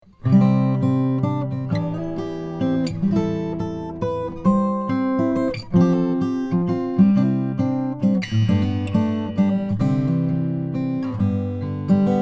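froggy bottom m deluxe guatemalan rosewood, guitar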